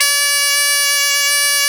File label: BOMB2 D3, BOMBARDE2, Celtic Flavours; USB Soundscan vol.42; AKAI